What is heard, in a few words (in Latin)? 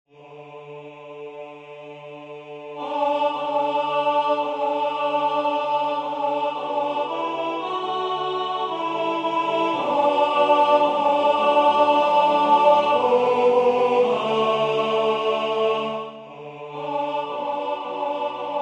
O sacrum convivium alto; Molfino